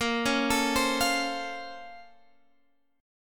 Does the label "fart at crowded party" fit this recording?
No